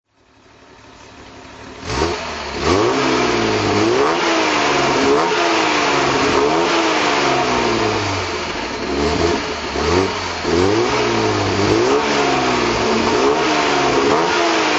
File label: maserati 3500gt throt, Maserati 3500 GT; 1961; Motorgeluid; V3D/ ClassicarImages, Marc Vorgers